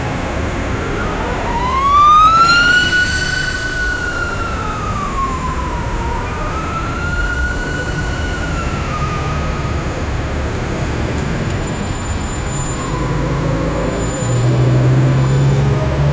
busy megalopolis rush hou

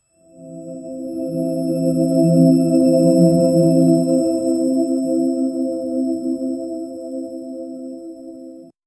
premium startup sound, crystal chime

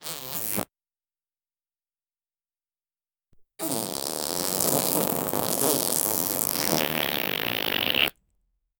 ultra short dry fart soun

